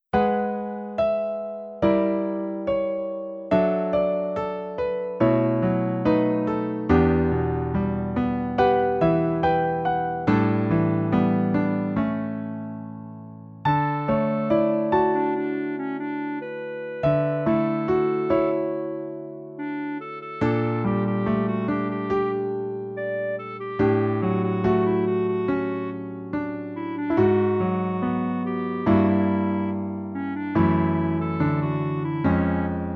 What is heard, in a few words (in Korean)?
Piano, 멜로디MR